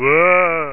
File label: APPEAR; Zombies